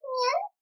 vfx Sad; nyan cat fly

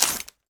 foley

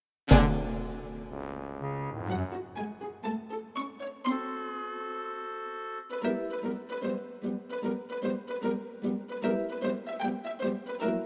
Stomp

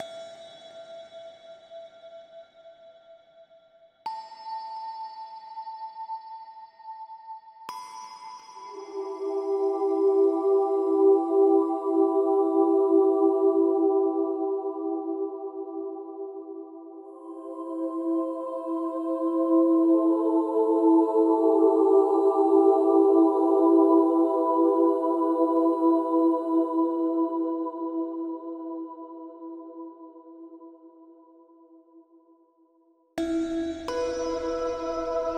music cutscene loop

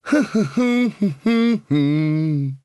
Humming; Hyrule Warriors Definitive Edition; Ghirahim's Humming; Demon Lord Ghirahim

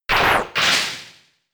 Thunder Shock

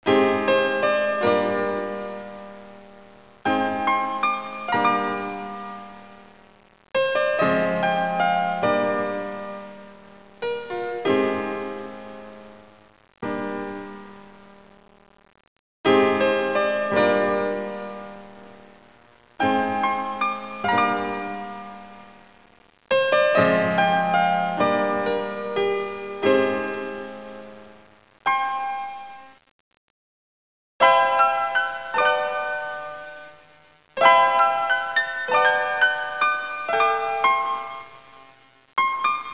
Heart; Lunar Eternal Blue; PCM; Game Arts 1994

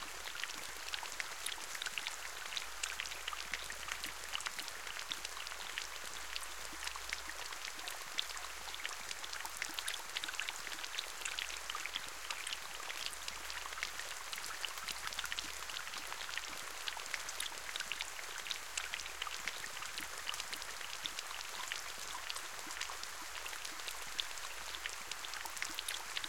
amb rain

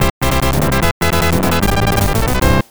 Battle Win; Mega Bomberman; Genesis

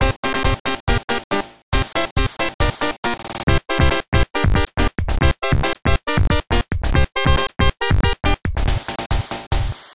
Battle Draw; Mega Bomberman; Genesis

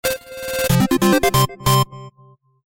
Enemy Clear Fanfare (8-Bit)

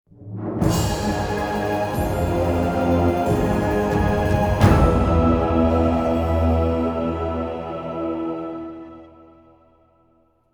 Magic Rune Puzzle Success

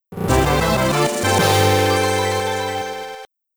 Item Obtained; mario luigi superstar saga enhanced soundtrack

